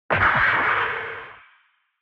Retro Anime Robot High

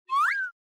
Anime Motion Low

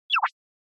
Anime Motion

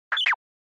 Motion Pop

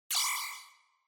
Single Accent Low

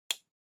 Pocket Watch Crown Press Back

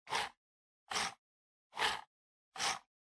PC Mouse Move Short

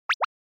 Anime Motion Phrase

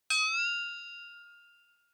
Single Accent

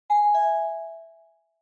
Doorbell Fake Far; ドアベル フェイク03-3(遠い)

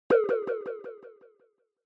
Onoma Sigh Delay

